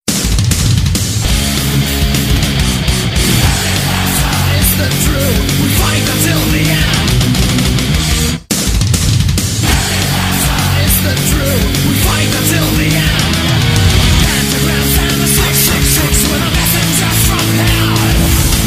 fire metal power; ringtones

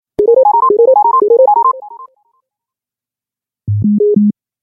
business bleeps; ringtones

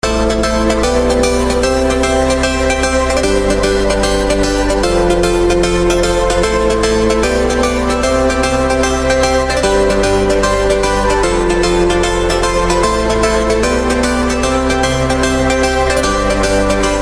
Hardstyle Transpose, ringtones

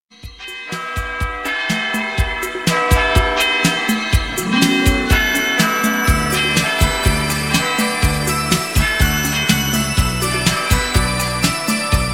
x-mas bell

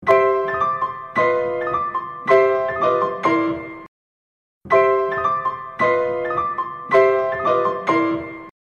boogy woogy

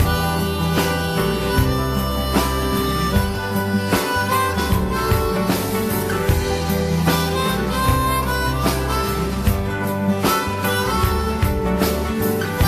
Harmonica and Guitar

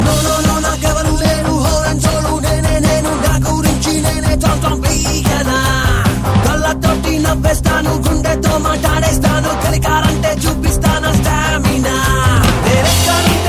mr nokia

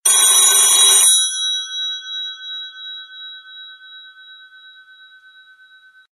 blackberry classic, ringtones

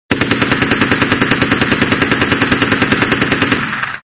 M16 Sounds

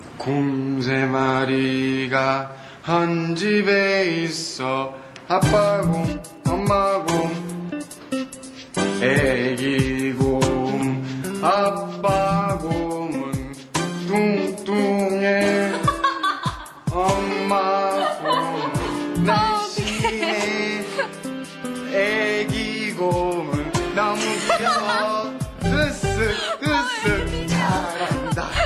rain bears song

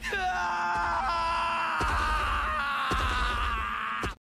SCREECH; Speedwagon

